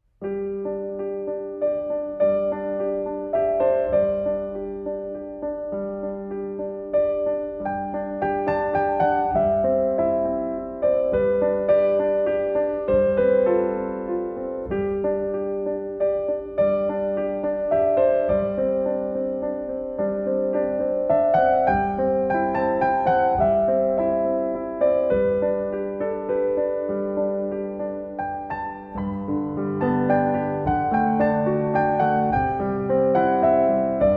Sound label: Piano Suite; Emma